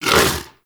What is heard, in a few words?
Vocal Tranquillized Grunt; Hunting Critters; Vulpaphyla